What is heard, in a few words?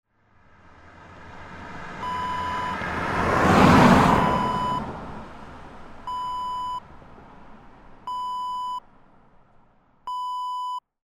car pass by